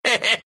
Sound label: laugh ru; Tusk